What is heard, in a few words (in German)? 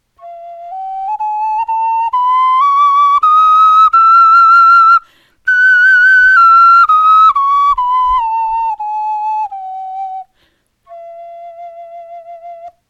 tonleiter